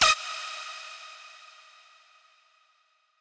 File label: lead